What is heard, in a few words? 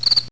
cricket